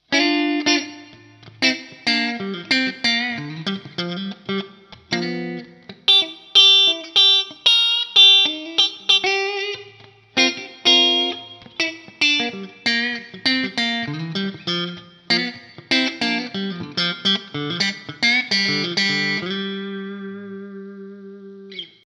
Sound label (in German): mitte bluesy